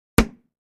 Gallon Jugs Strike To, plastic

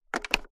phone handset down

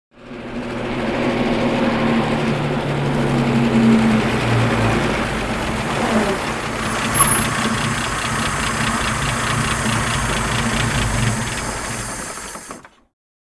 Stop Off Aston Martin

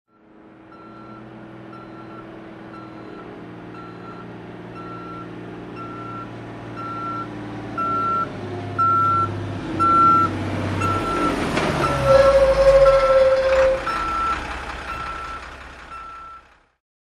Garbage Truck Back In

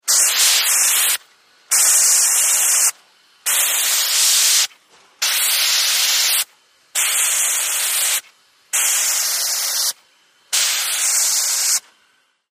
Close Steam Blast, steam

